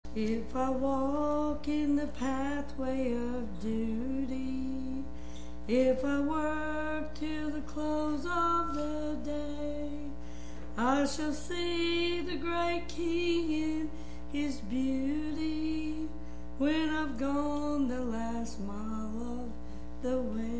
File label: singing